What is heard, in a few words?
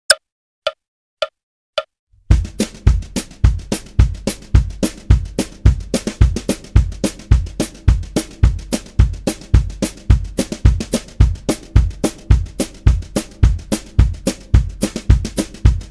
Country Rhythm 108 bpm, Guitar; Guitar Aerobics Disc 2